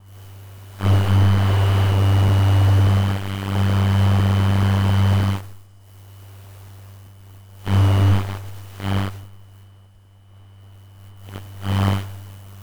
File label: Searching Signal Fx